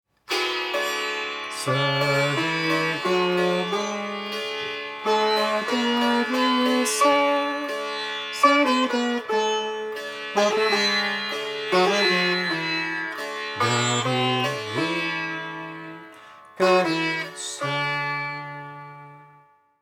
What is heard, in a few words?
sitar sargam; Alphamelodics; ragajunglism